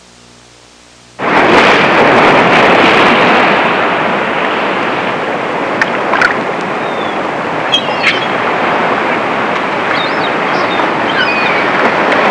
Waves crashing beach ambience

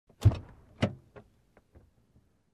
Car Door Open

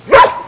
Dog bark single

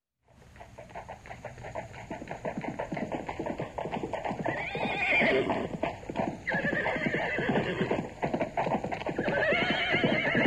Elephant trumpet with bird ambience

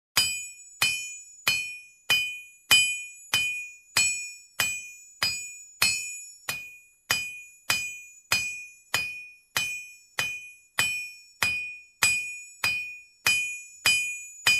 Hammering on Metal in forge

